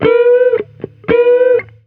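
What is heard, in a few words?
pick guitar; Roots Disco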